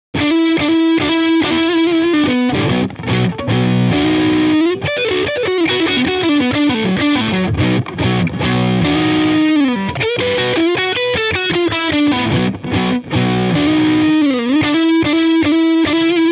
Compressor

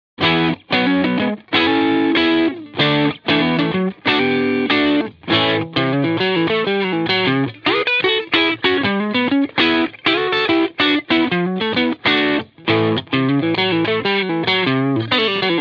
Overdrive

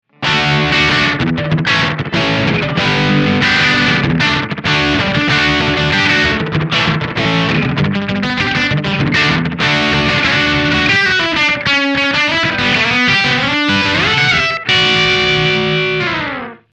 AD999 ambiance, pedales

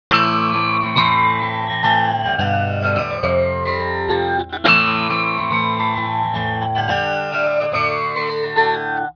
triggered phase, pedales; Hyper Phase

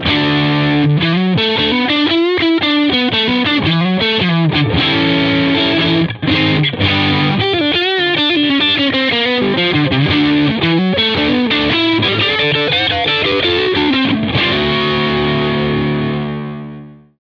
Auto Filter

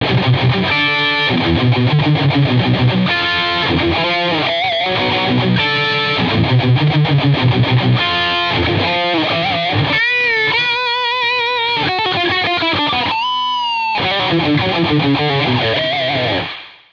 Graphic Equalizer

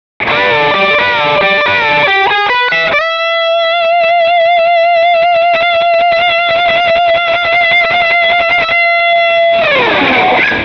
rock sustain; pedales

